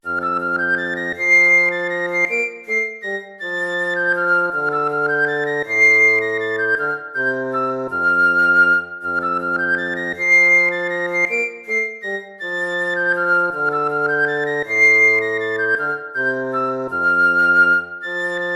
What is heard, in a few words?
lute